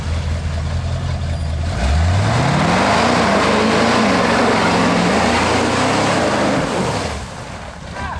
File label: sound f250 ranger, custom